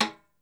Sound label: SN, Percussion